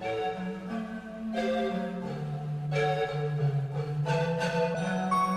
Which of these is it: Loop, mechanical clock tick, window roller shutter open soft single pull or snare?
Loop